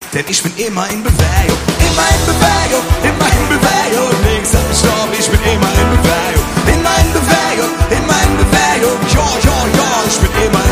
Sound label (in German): live mit orchester